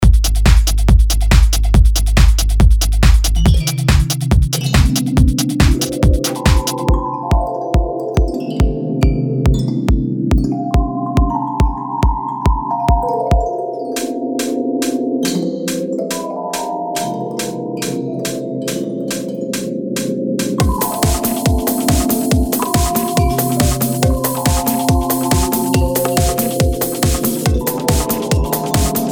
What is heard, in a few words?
DANCE PSYCHE FANTASIA SOUNDSCAPE